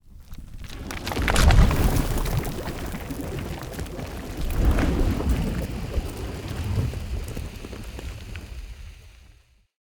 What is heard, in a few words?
Lava Pit; side quest society